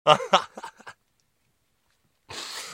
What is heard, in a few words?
Funny man, Laugh; created using AV Voice Changer Software programs